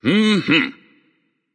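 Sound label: nonverbal agree; smite; voicepack; merlin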